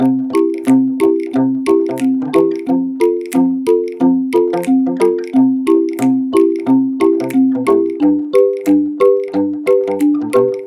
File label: music